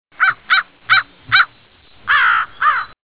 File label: Black Crow Bird Sounds Car Horn